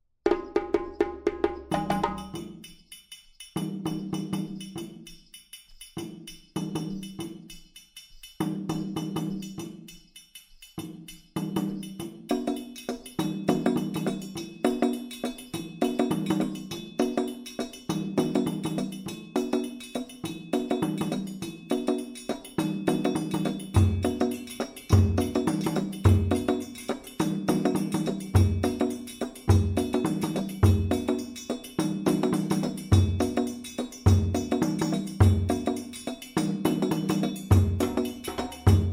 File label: Fankani Opbouw, djembe